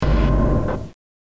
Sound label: fade; cstrike